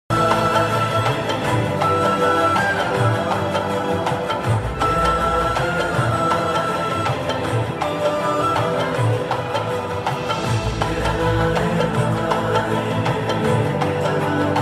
movie climax bgm ringtone movie friendship bgm ringtone